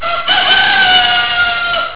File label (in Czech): kohout